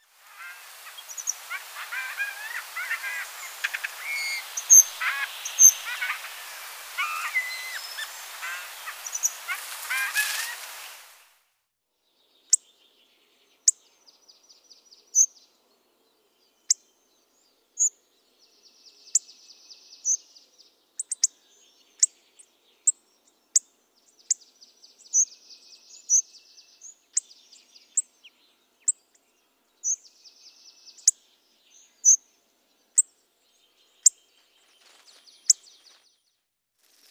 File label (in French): Gros bec casse noyaux